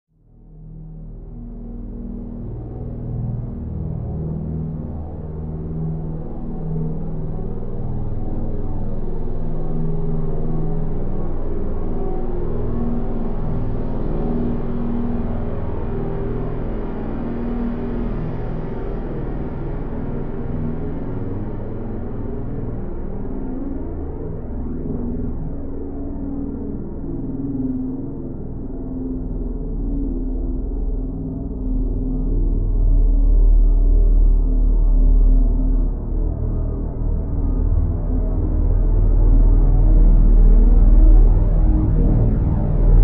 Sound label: Bed Ascend Terror